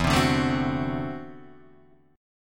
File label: D 9th E, guitar; strummed; JGuitar